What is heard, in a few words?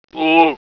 Pain; svencoop; Roy